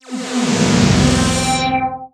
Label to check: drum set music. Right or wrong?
Wrong